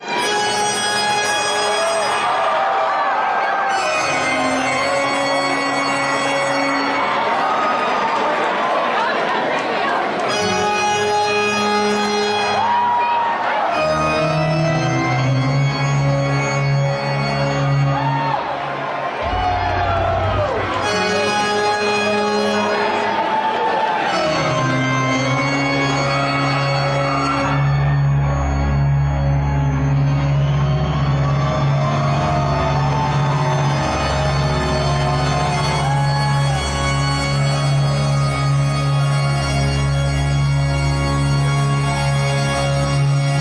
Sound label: Toccata Fugue D